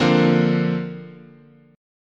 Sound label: D Minor 7th Sharp 5th, guitar chord